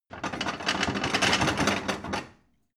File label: plates rattle short